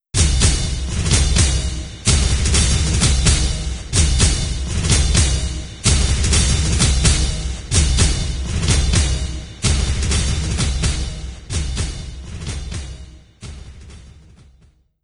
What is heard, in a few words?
Goals Complete; Buildalot